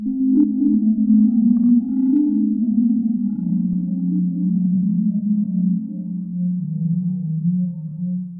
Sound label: bgm, Music; ゆめ2っき